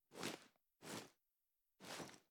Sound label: reload cloth; reloads; gmod